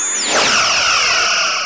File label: weapon